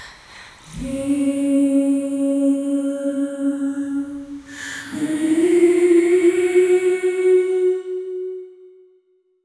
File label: horror film opera vocals